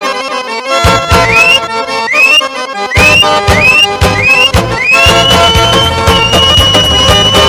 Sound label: lezginka